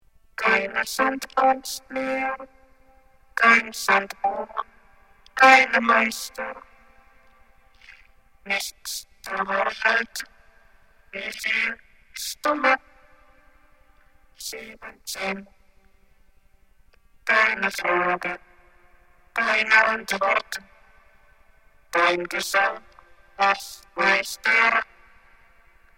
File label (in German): Trompete